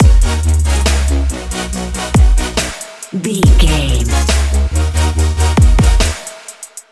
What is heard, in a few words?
future logo intro